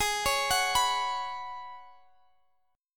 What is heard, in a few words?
F sharp Suspended 4th G sharp; arpeggiated